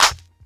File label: hand clap sound D key, Royality free hand clap sound tuned to the D note. Loudest frequency: 3422Hz; percussion; single hits one shots